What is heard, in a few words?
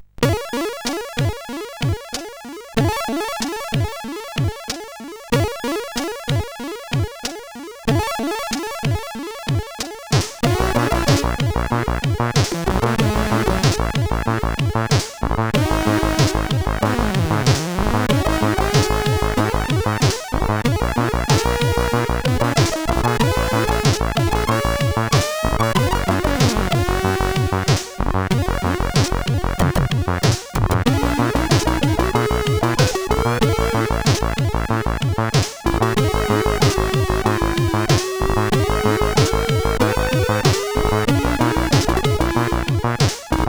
sid, CSG8580; Old Idea; hvsc